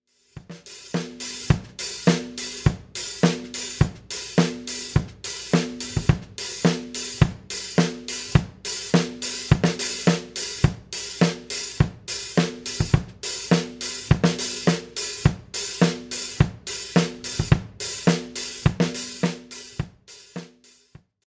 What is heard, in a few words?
disco